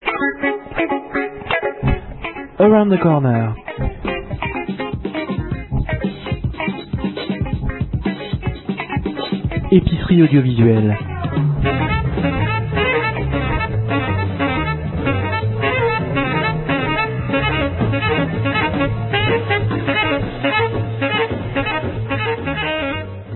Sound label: ATC jingle